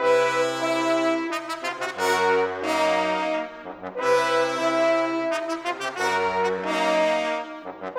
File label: Brass; Sonic Foundry (Sony Creative Software); Orchestral VOL-2; Modern 16